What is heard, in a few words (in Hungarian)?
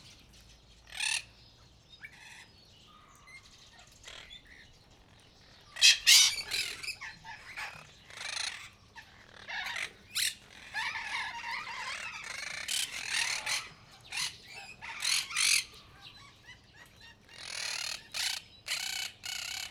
diszkreten beszelgetnek, barat papagaj; debreceni zoo 2012